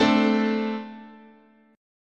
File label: A Minor Double Flat 5th, guitar chord